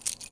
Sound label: Foley